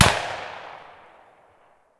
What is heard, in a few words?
mr43 fire far; Escape from Tarkov